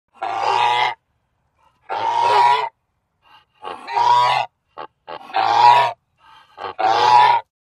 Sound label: Goose Honks Multiple